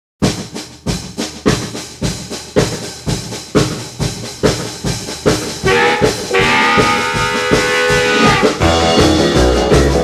HARMONICA THIS TRAIN, rockabilly, Boogie blues Rock